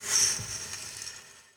freeze attack; Apotheorasis

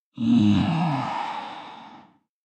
Lurker Hit

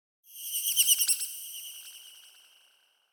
Bird Very High Warble - Pass By; Hollywood Edge; sound designer's toolkit v2

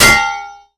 Armour Helmet Hit Hard; SFX Kit; Creaks, Scrapes, & Misc

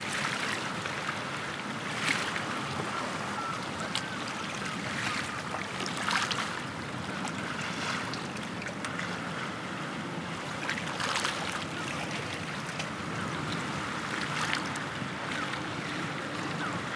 sea small waves; best service Blue Box; sea ocean

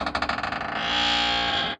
door creak, household; NS Studios - ultra sound collection

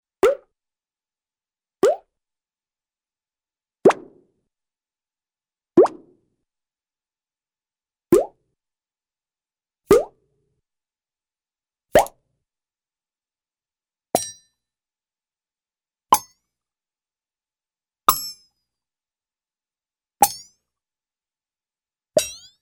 Bubble Pop; Cartoon; Hollywood Edge; Cartoon Trax

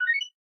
alert; Blind Drive

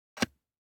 DICE on Plastic, Grab, Standard, 1 One Die; Samples; ArticulatedSounds; DICE Sfx Party (Extended)

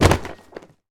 Book Drop Carpet; SFX Kit; Foley - Misc

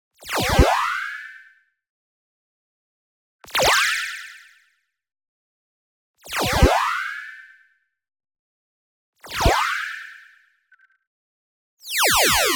TONAL Metal Modulation; boom library; Anime Essentials

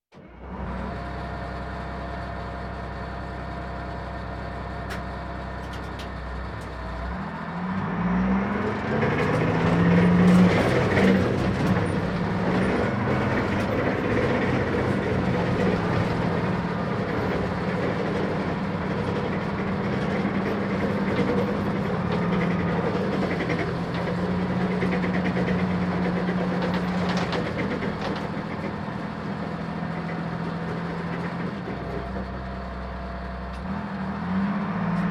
Military vehicles

